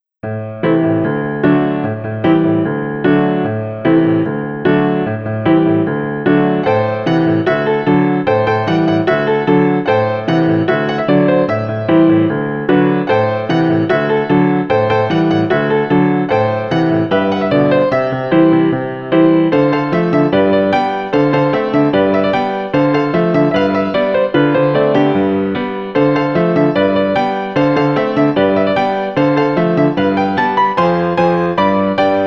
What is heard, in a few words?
piano; Kirbys Block Ball